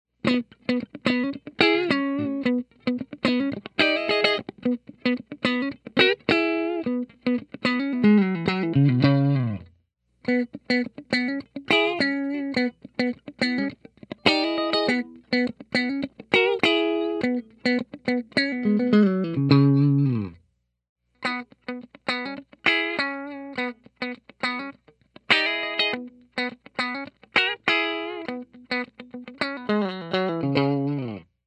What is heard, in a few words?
convertible lipstick clean, guitar